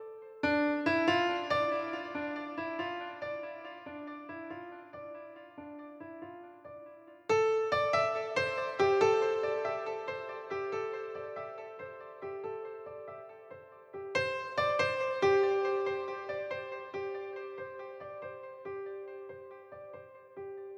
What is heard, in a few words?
Piano 140BPM, Dminor; Melody & Synth; Vengeance Samples